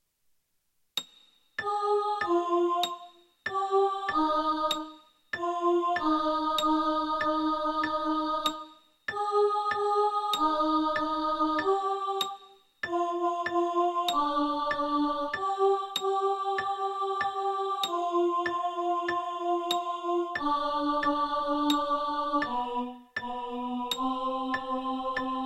alto, choir

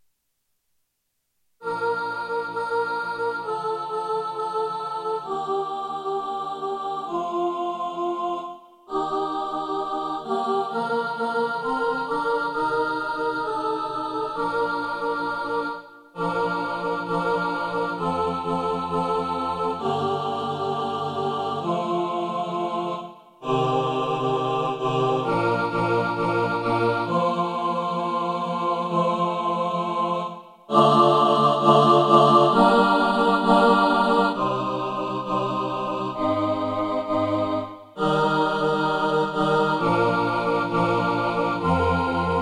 hear me satb, choir

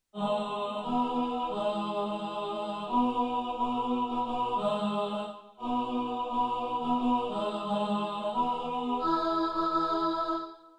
gifts tenor, choir

